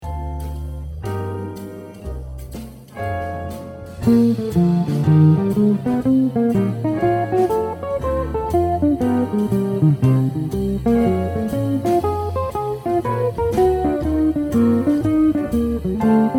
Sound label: Lick